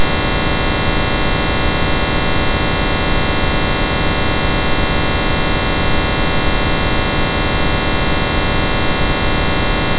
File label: noise low